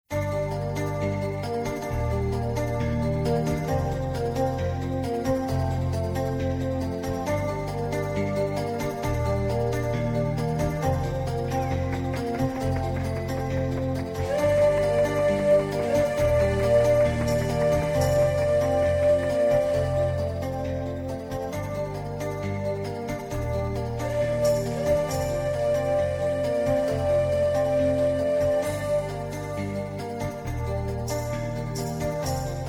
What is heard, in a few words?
train song